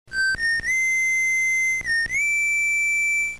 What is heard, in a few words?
Whistle; Megaman; Protoman; top 40 video game tones; android